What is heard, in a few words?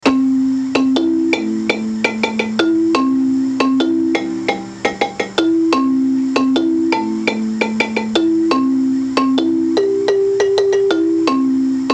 bari solo variations, marimba; manhanga